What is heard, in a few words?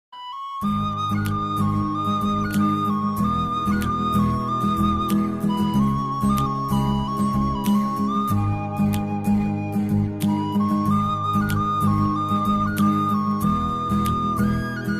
flute ringtone; super khiladi 4; bollywood